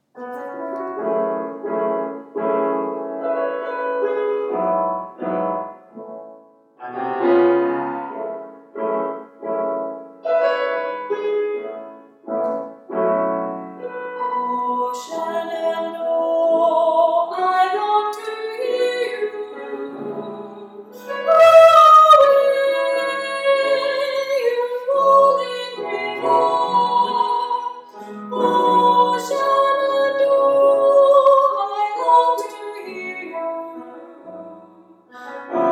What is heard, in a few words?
shenandoah vocal model; kcc audition